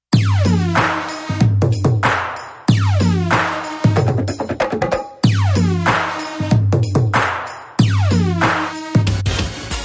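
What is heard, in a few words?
background music Telugu Ringtone; F3 More Fun Begins Soon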